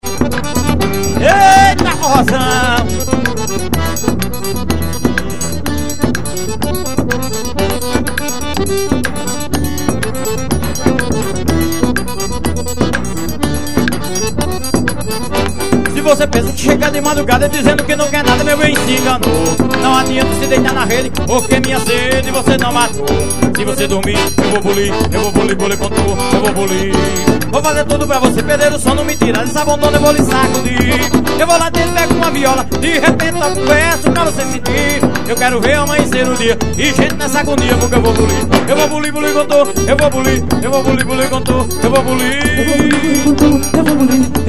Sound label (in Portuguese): acordeon